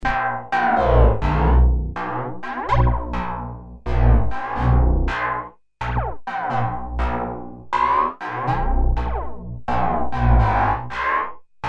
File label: Snake Bass Pad